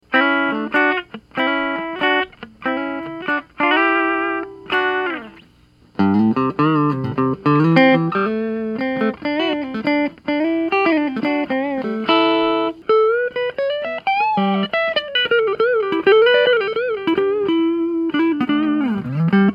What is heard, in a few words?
noodle test, guitar